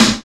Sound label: SNR, Cheez Snrs